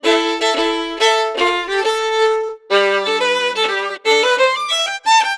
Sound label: Celtic Violin; Sonic Foundry (Sony Creative Software); Continental Drift Aftershocks